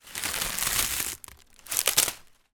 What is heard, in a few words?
leaves plant dead dry scrunch movement; foley